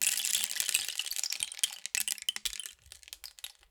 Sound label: Rain Stick, Percussion Samples